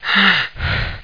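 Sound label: single gasp; CD Action; fly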